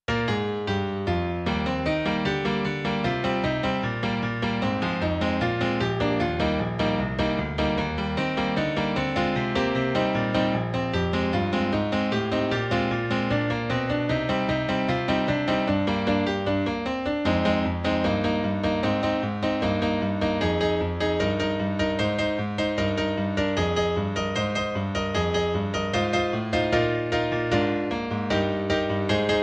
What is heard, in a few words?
Piano